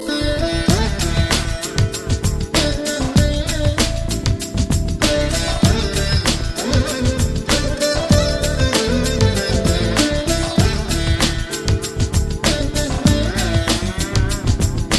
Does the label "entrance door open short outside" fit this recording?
No